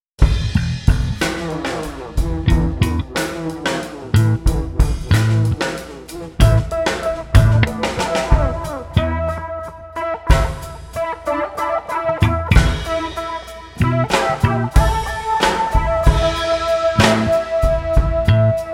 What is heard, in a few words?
drop the beat